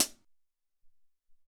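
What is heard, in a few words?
Closed Hat, Alesis SR-18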